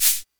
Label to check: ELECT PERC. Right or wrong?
Right